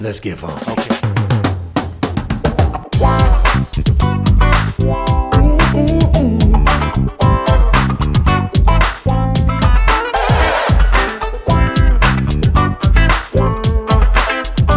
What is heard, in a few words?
Funk Songs; Cover Band